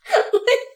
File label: giggles and laughter; SyrinScape